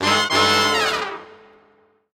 Transition hit

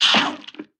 potato spit worm

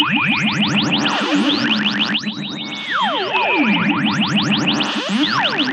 Carrot Mind Meld Loop

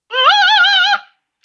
vox positive opera short

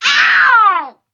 angel death vox

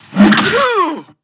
level bird stretcher garbage regurgitate start

